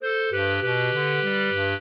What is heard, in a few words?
trio, clarinet; Mozart